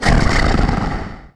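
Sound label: demon ambient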